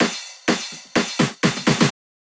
Drum Loop DrumFill 126 C m Drum Fill

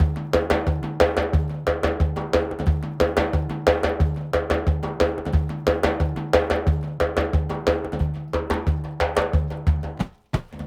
90 perc bendir; tepekoy

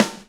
Snare

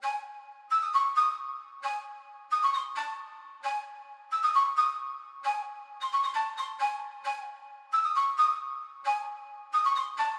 Ethnic Trap 133 Ney Flute G min

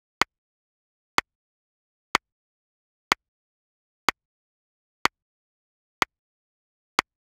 Beat 124bpm Clap

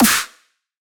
snare B

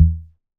bass one shot E; cerise

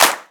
clap smash

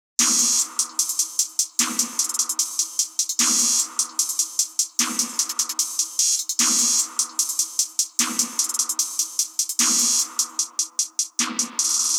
150 Drums

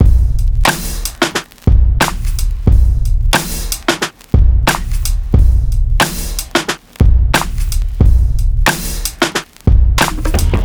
90 drum loop; beaches

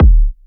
Kick

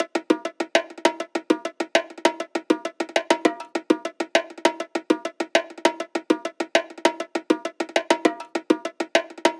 100, Bongos Loop

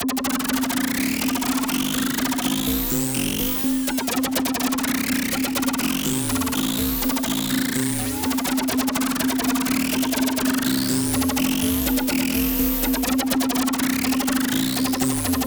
Atmos, 124

